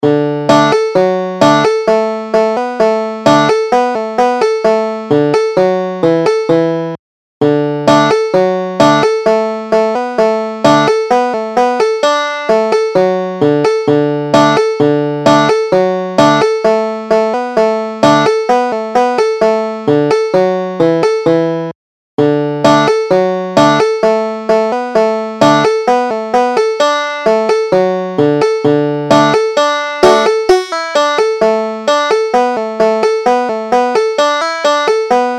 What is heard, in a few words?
hornpipe, banjo